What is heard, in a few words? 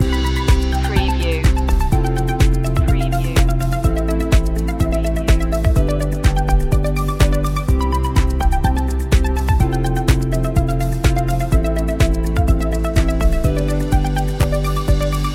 corporate thoughts loop